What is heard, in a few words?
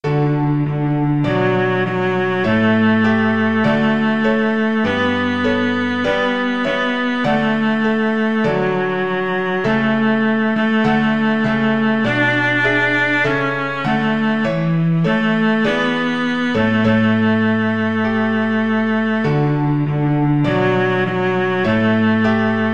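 violoncello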